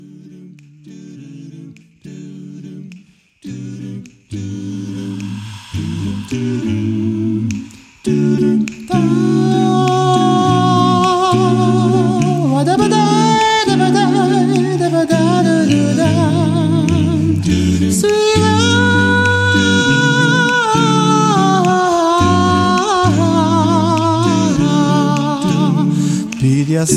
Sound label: lullaby